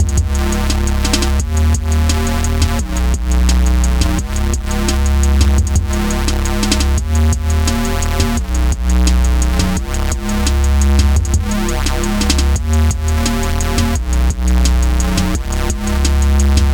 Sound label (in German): KI MIDI Generatoren WAVtool Drum and Bass Versuch